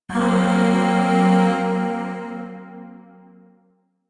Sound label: Zariman Void Shawzin Blues Chord